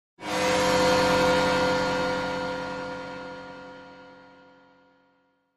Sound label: Feedback Elect Shimm